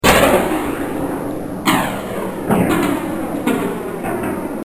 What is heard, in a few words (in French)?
Artifice